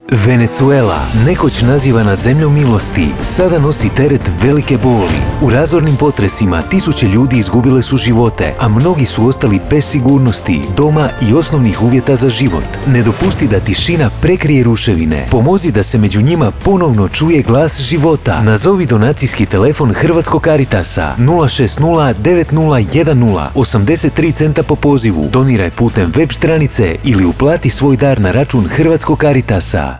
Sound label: jingle; Caritas Venezuela